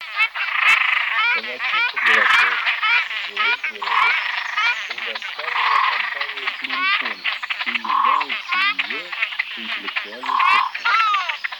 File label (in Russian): Огарь Casarca; plurifon